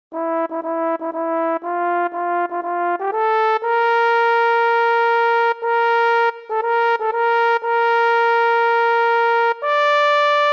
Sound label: Tenor, Barbershop